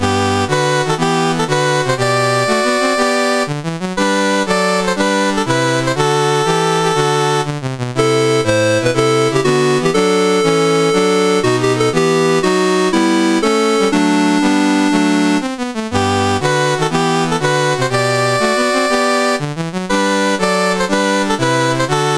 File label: Ivory Coast, National Anthems, CSG 8580